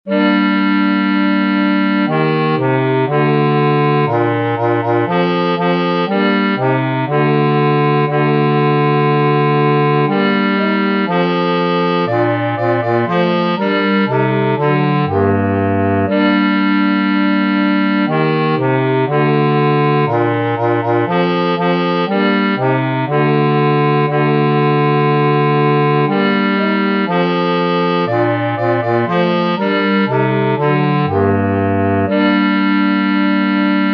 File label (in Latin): Psallite Deo BASSI